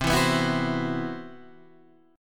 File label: C Major Sharp 11th, Guitar Standard; 8,7,9,9,7,8; strummed; capo=7